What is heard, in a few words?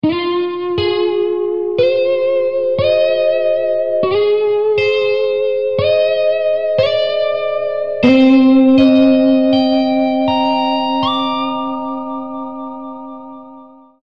Riffing, ringtones; HTC Desire